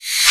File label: REV SHAKE, PERC; NorthStar - The Wizard VOL-2; TSAR PERC